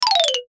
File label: Musical Marimba, Musical Marimba Descend Slide; Mallets; Blastwave; Sound Effects:Musical